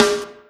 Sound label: snare, drums; virtual drum sounds; custom drums